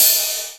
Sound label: Roland R-8 Crash